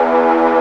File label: Organ, MortStrg+WurlVox